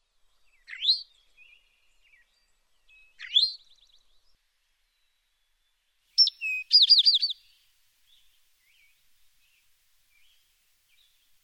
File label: towhee A B C